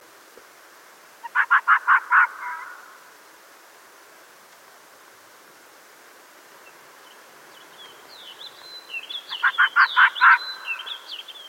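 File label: arctic fox; FJELLREV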